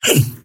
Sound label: pain small